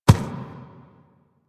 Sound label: Ram Step Walk Dirt; Animals; DE; Warframe; Quests; Duviri